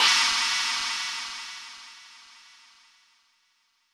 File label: Crash G#5, Roland U220; drum machine